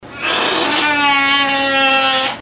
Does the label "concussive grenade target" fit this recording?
No